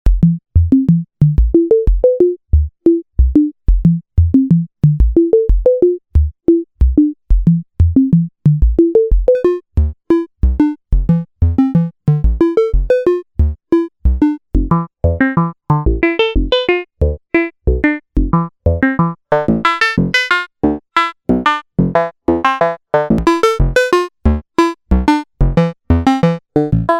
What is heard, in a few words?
wave